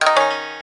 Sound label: gayageum, keytone